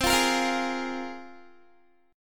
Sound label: C 7th Suspended 4th Sharp 5th, Guitar